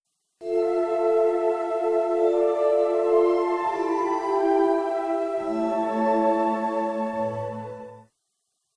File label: BGM Old Fanfare Defeat